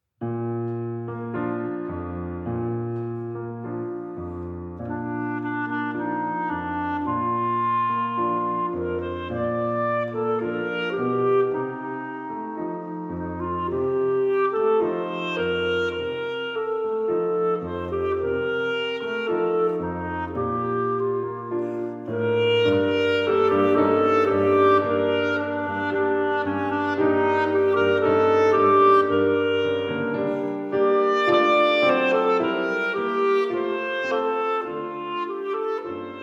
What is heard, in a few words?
Tango